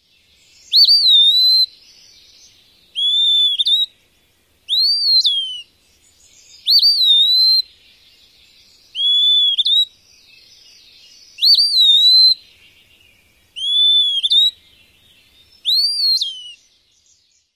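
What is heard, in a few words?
Eastern Wood-Pewee dawn song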